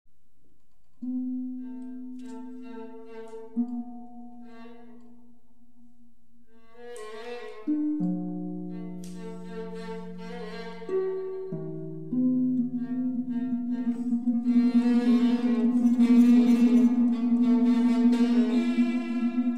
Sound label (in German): Ballade fuer Violine und Harfe